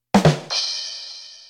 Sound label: Rimshot Sting; Bart Nagel